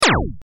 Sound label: lazer; spaceinvaders